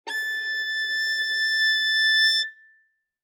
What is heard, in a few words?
Violin arco ff sul E A6; MIS Pitches - 2014; Strings